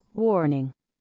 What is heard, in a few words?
warning